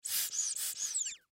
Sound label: Toy Rubber Duck, Toy Rubber Duck Squeeze Airy; Toy Animal Sound Effects; Foley; Sound Effects:Toys; SoundEffects-Blastwave